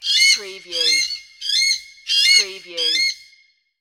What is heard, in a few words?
Weka Calls